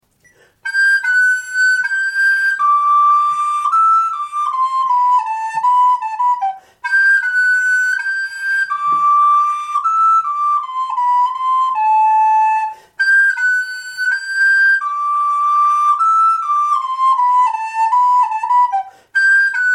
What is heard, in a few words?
flute